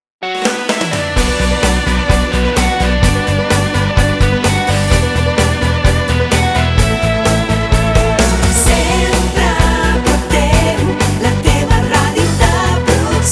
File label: Rock